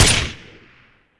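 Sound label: svd shot silenced, weapons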